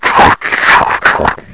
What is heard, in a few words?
ZOMBIE